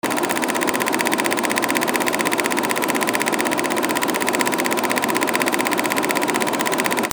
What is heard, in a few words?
CAMERAS